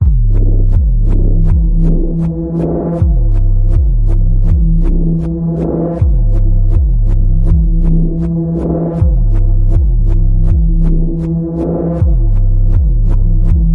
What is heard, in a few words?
Panning Pulse E, Key of E